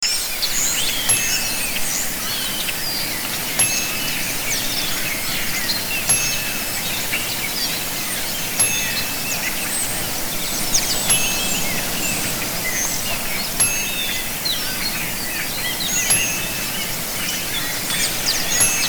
Jungle Scene With Waterfall Birds And Natural Ambience